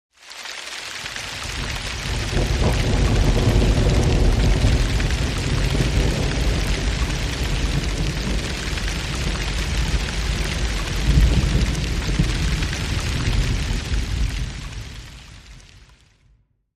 Tranquil Rainy Day Atmos

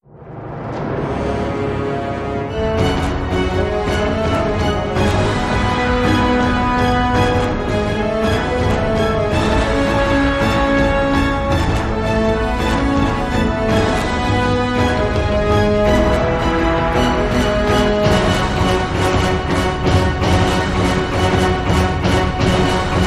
No Choir

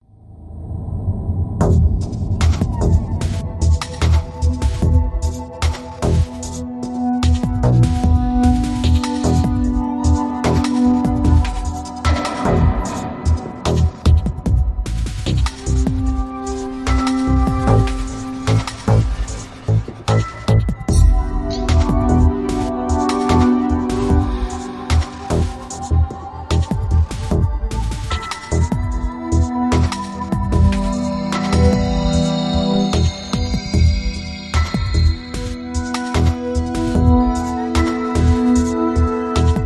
With Drums

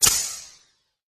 Quick Flip

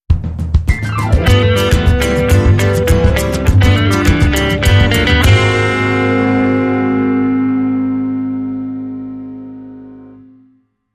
Latin Lover Rockish

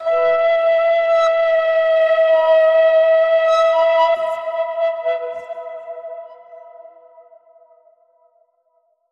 Strange Shadow Flute Bend

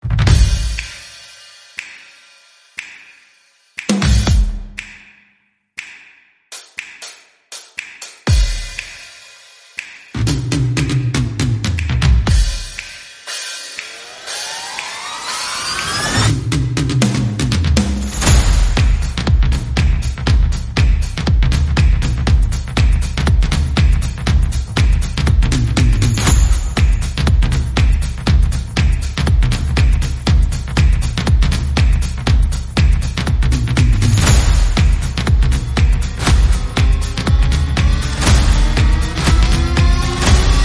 No Melody

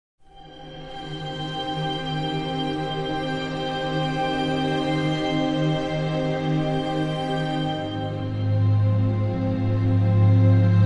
Iceland Soprano No Vocals

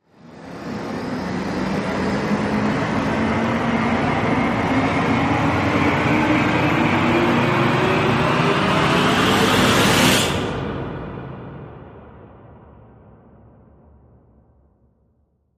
Rise Precipice

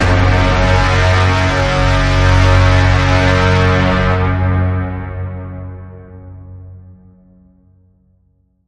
Bend, F sharp

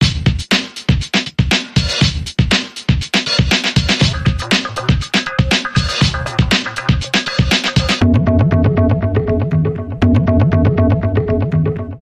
Percussion Bumper Reversed

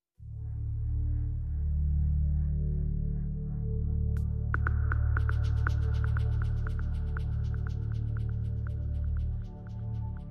No Melody No Woodwinds

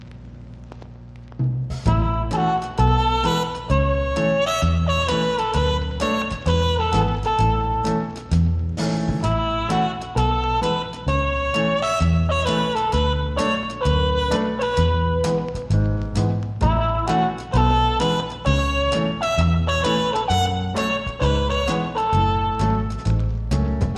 Instrumental

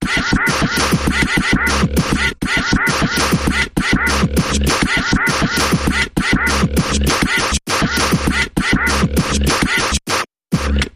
Mechanical Percussion Variation Medium

